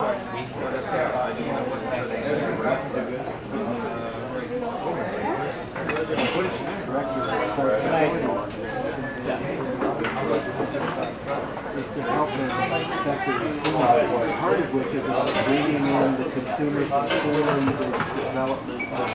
Restaurant Crowd BGS, kitchens

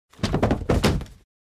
Body Falls Off Chair

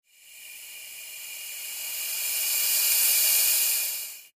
Processed Air Release

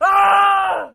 Death Screams 6; 10 Male Screams, Various

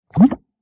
Bubble Pop Deep Hiccu, bubbles

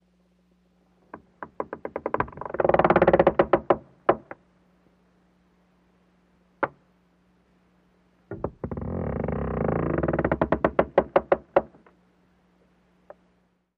Creaks Slow Wooden

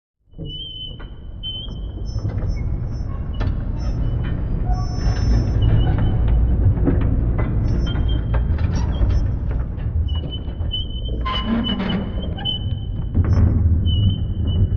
Castle Gate Raised, drawbridge

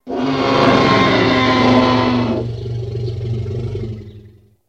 Dinosaur Roar Growl

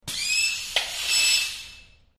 Air Release Valve

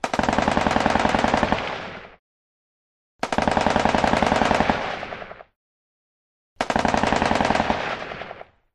M60 Bursts Medium Dua

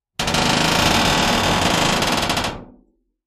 door creak med, squeaks

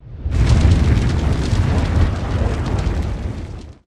Dragon Fire Whooshes; With Fire Crackle Element X 10

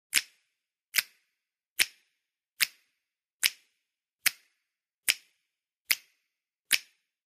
Fingers Beat Time, finger snaps

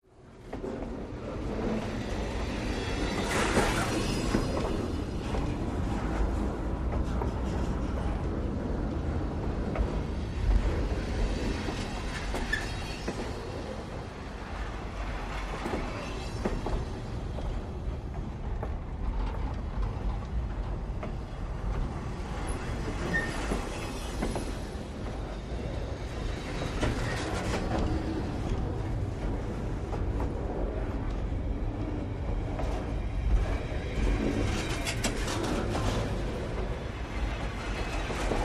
Train Railcar Coupli, couplers

